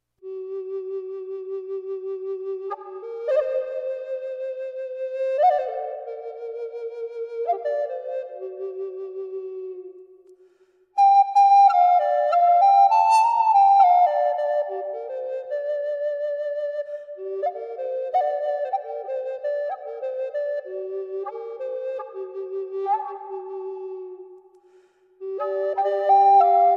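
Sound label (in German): G neu mit Reverb, indianerflöte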